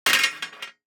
DICE on Metal, Throw and Roll, Standard, 2 Two Dice; Samples; ArticulatedSounds; DICE Sfx Party (Extended)